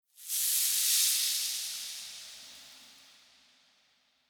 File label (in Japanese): sarasara